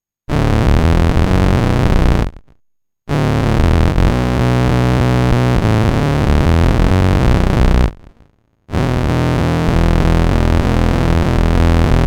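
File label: happiness bass vocoder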